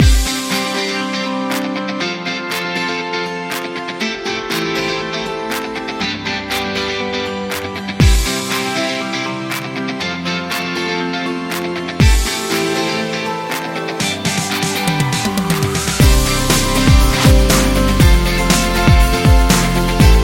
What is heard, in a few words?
Pop Happy